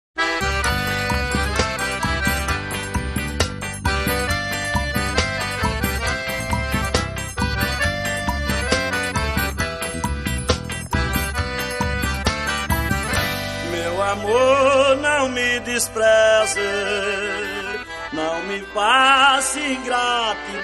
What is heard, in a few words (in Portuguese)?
aboiador